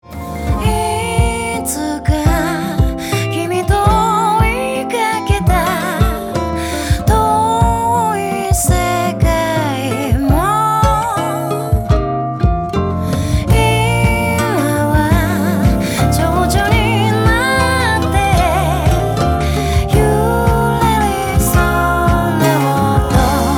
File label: guitar